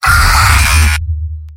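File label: pain severe, male; demoman; tf2; garrysmod; mvm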